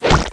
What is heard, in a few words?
bug splat; Winnie the Witch; bathroom